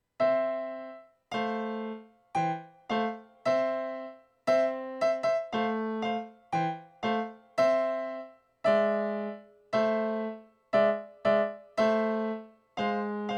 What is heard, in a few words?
March